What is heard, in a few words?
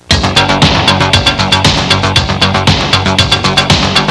funky in; Sound Library 2000; Wayzata Technology; Music In